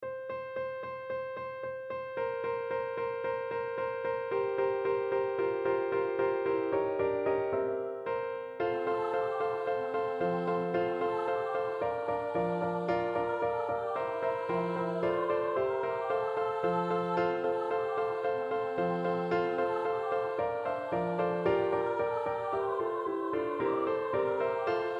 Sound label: Tenor; Jingle bells